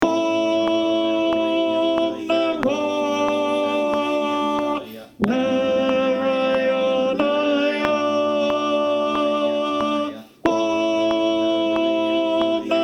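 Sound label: Alto, choir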